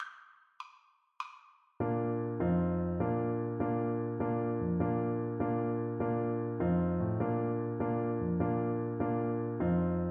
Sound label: another jig TPT